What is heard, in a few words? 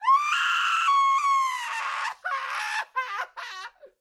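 distant scream; Project Zomboid v41.73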